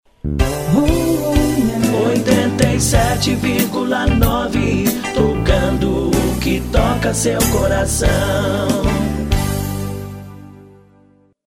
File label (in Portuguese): vinheta cantada